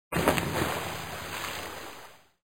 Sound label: splash